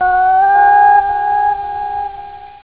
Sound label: scanner; da capo vol1; instruments; startrek